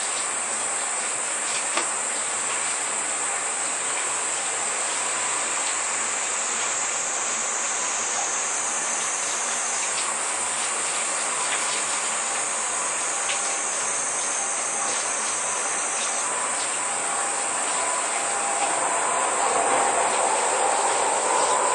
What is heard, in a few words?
bath siren biggest no line car